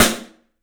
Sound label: Studio Snare; E-MU Producer Series Vol. 5 – 3-D Audio Collection; 3DPercussives